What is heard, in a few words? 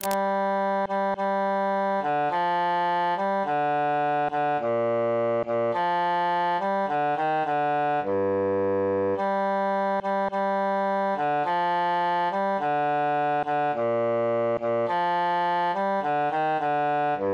bassus solo; pastime with good company